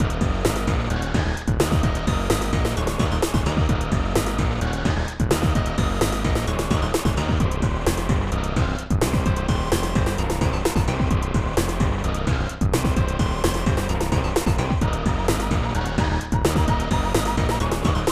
Class Cleared; Exhaust Heat 2; SNES

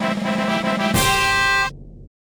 Toy Fanfare; Rugrats: Scavenger Hunt; N64; Pirate Treasure Hunt

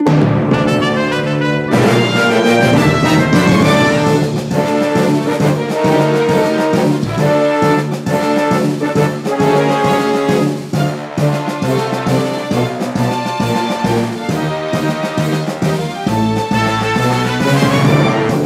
Leave Port Fanfare; Last Stage; Steel Diver

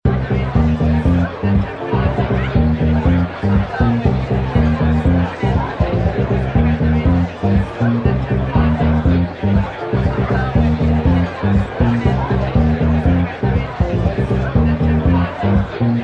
Amb Houseparty; City

